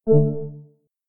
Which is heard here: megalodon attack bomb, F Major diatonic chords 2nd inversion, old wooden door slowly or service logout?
service logout